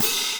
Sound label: cymbal; osu